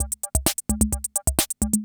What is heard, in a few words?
CR78